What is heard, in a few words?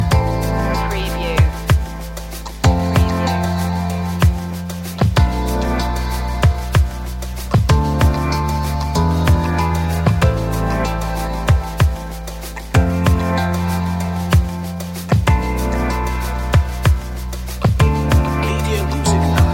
seaside lounge loop